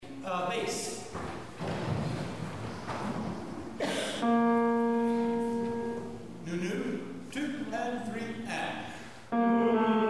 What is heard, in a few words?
Bell Chorus; rehearsal files